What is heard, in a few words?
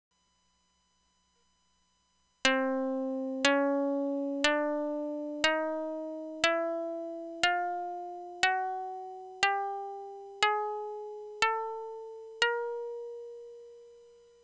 plucked random; PIC32; Sound synth